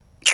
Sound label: sculk; Minetest; VoxeLibre